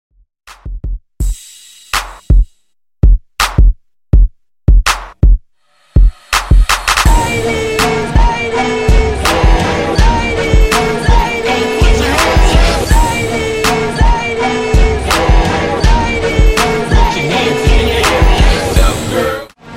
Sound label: Re Drum Hyped